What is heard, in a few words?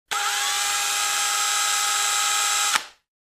electric drill